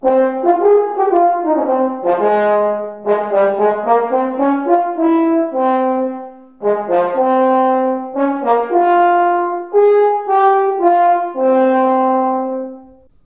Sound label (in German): waldhorn